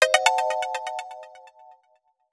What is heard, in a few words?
Strike alert; Sony Ericsson; PlusFourSix; SE Sound Project; Lars Edvall